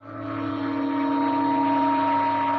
build; Mindustry

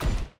shoot Salvo; Mindustry

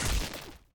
shock Bullet; explosions; Mindustry

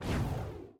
shoot Missile Short; Mindustry

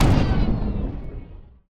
wreck Fall Big; explosions; Mindustry

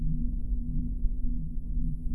loop Hover; loops; Mindustry

